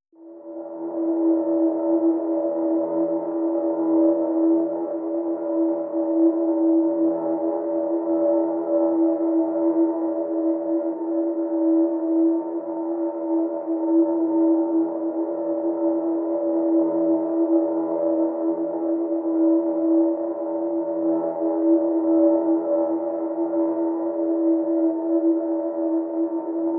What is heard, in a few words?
PADS (E)